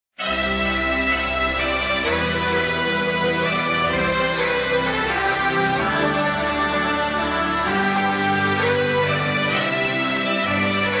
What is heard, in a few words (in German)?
Hymne klingelton; 1 FC Koln